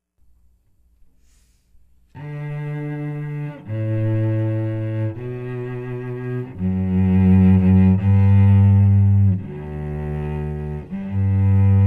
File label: strings